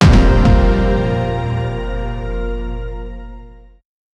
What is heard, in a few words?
cinematic sound starts, bell tone